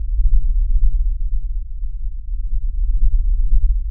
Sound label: External Jet